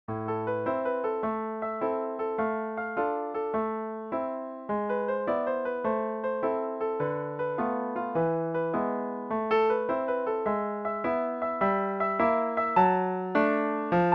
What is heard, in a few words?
tarantella piano